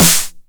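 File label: 909 SD Tape, snare drum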